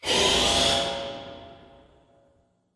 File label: Tube Alarm Dist; Boomtown; Reaper; Titanfall 2